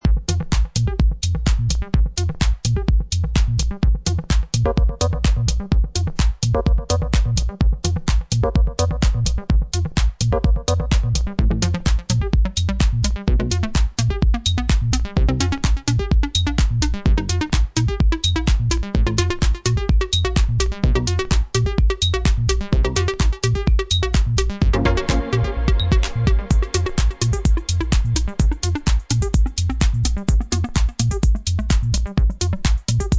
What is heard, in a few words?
Dub Mix, techno/house